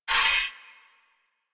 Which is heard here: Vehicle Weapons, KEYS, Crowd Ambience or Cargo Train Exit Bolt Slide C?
Cargo Train Exit Bolt Slide C